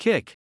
KICK

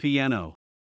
PIANO

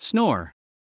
SNORE

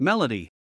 MELODY

